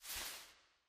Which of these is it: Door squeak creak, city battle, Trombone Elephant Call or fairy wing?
fairy wing